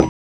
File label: PNO, Electrics; Club-50 - Foundations Roland; Rds & Wurly